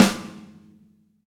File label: XV Snare, Roland XV-5080